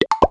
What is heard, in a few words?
TOTEM